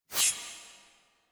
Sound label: bright sword